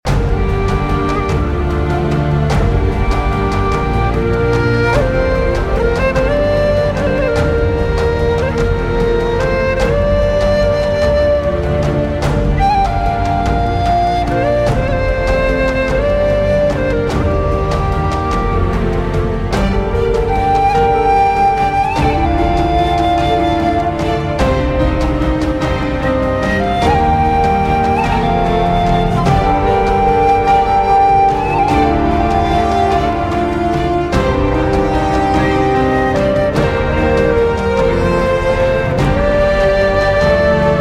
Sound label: Irish Low Whistle